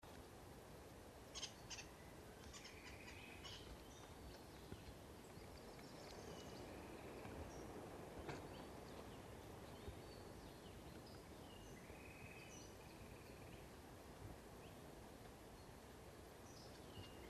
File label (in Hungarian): hercegharkaly hangja